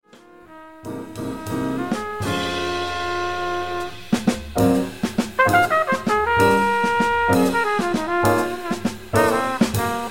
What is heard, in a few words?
minus bass solo